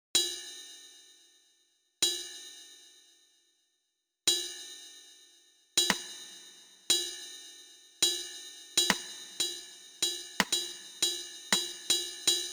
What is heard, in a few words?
MIDI ditty